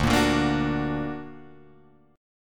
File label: D Augmented D down Guitar Drop D